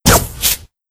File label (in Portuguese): teia; cstrike